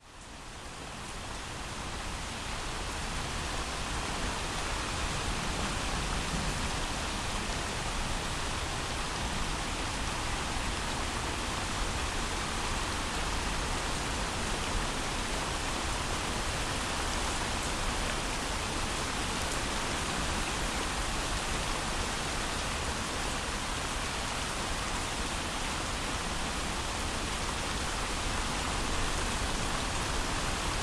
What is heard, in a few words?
rain